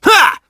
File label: heavy grunt; sonic; vc roger